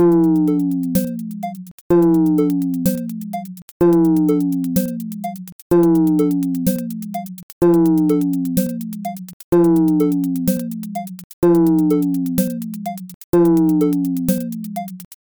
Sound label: turbo folk